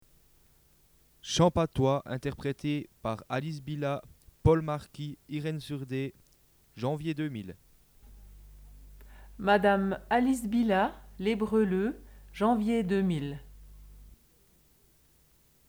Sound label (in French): Chants patois